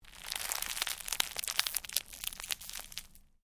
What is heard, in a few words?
Cellophane crinkle; Material components for constructing more complex sounds; Plastic; Sound Effects:Materials